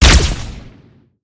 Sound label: fire, weapons; ppistol